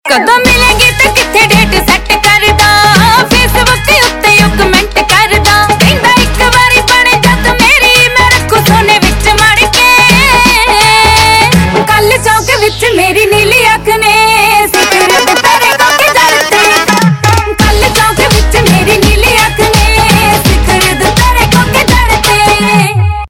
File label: Ringtone, Punjabi